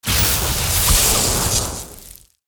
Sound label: Lightning Underlay Play